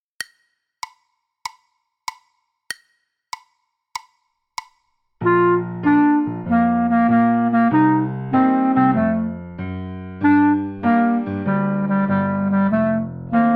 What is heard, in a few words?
Etude, Jazz Clarinet; Beginning Jazz Clarinet Studies Vol 1